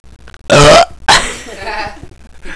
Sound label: burp